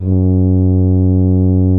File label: tuba loop note